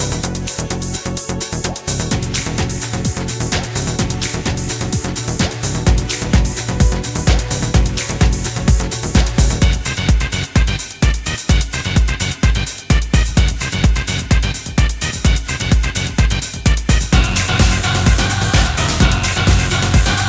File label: Rave Rhythm